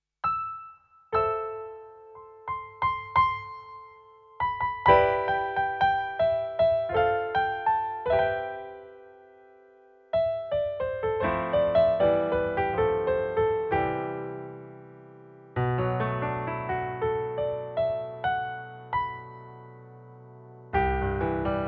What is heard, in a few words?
Grand Piano